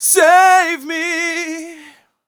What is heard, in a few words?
m 127 mel a, Vocals